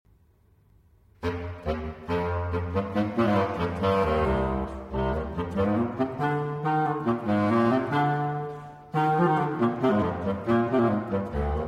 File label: Concert Piece For Clarinet Quartet & Piano Determination